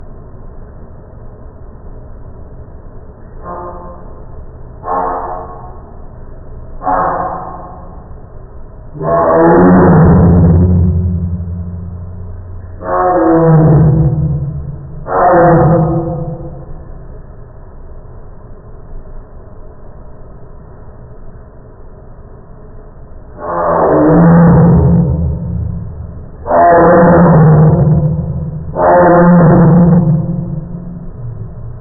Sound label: Tiger Barking